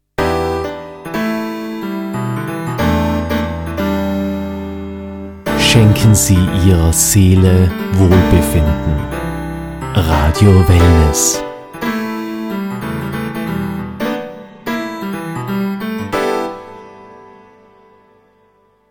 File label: Jingle